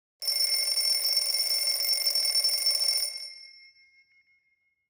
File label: Alarm Clock; operation-arctic